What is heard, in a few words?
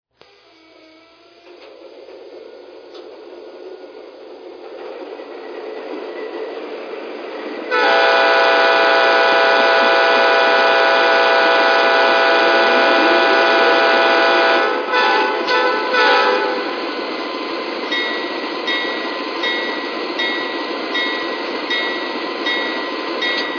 ES44AC